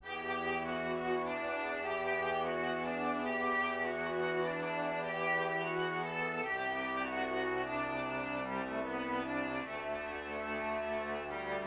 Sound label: violin